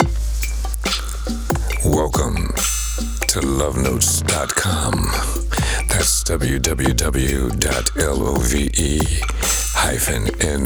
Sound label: rap